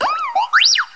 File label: R2D2 alarmed; UNUSUAL KITS and FX; R2D2 Construction Kit